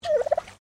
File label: Bird Turkey Domestic Call; Birds (Sound Effects:Animals)